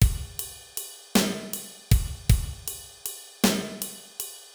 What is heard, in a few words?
Basic Beat Ride; Pro Tools Blues